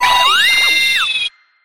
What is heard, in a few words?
cry